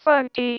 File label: Female vocals and robo-vox